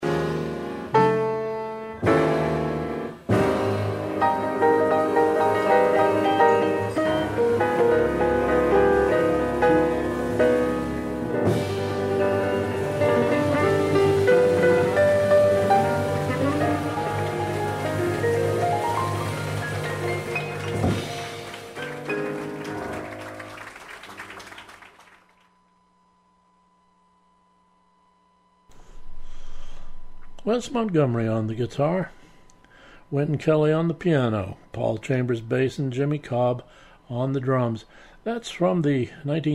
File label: jazz